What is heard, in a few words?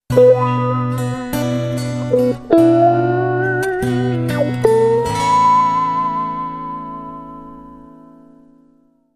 Ballad Wha